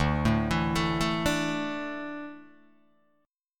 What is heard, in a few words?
D Suspended 2nd D down Guitar Open D